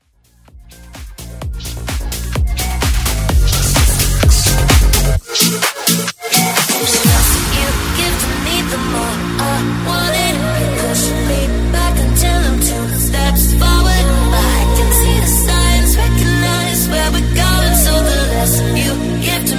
Drums Festival Bootleg; 2025 Festival Bootleg

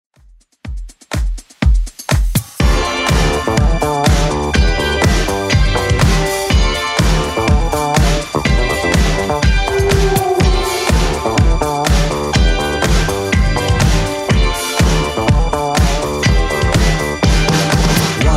80s Club 123 bpm; 80s Club Edit

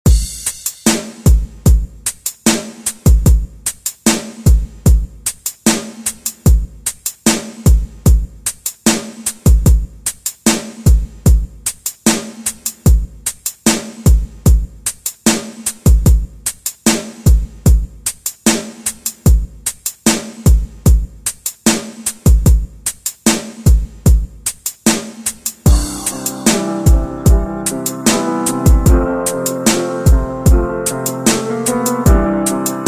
Rock Redrum; Deejay Hit

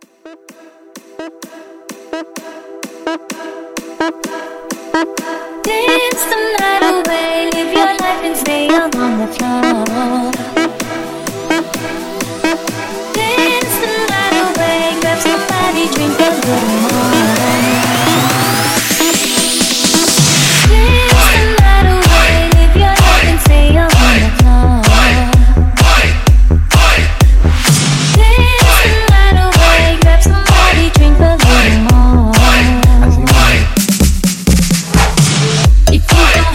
Edm Classic Mashup 128

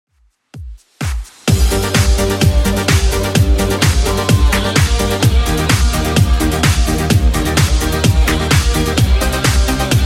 80s Redrum 128bpm

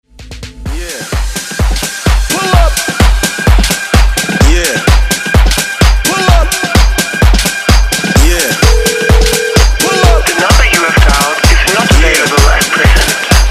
Dance Redrum

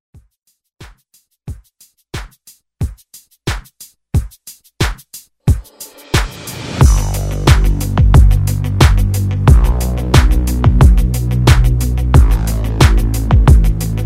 Top40 Redrum

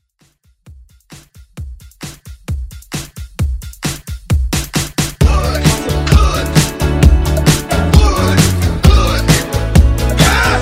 Hype Redrum

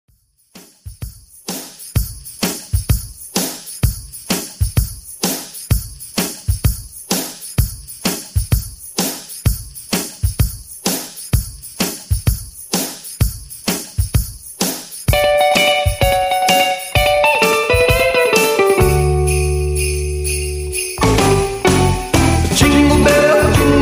128BPM

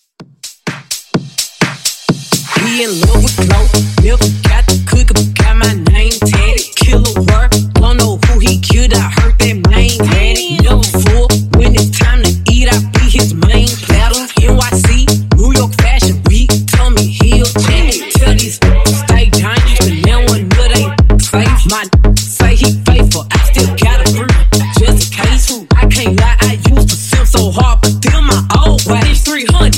Funk Clean 128 Bpm

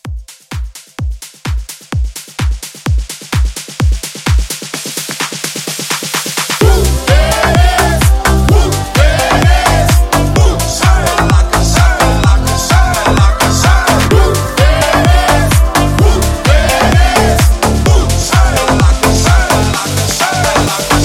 House Rework

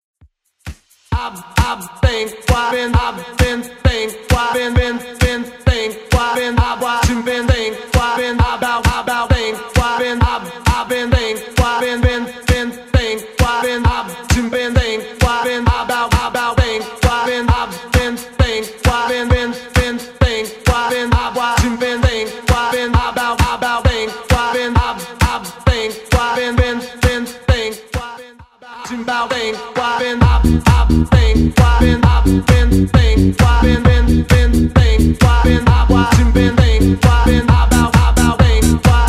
90s redrum 132 bpm

